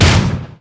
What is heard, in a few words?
basic attack; lucian skin01